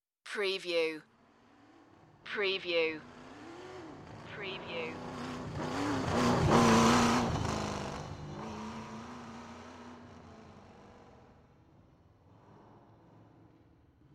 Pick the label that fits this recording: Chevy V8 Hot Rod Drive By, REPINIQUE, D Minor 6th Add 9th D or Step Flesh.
Chevy V8 Hot Rod Drive By